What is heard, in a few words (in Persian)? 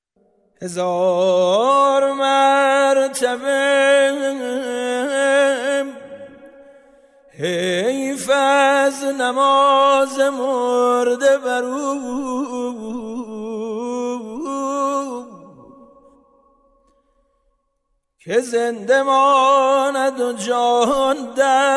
آواز ابوعطا ـ حجاز; aboo ata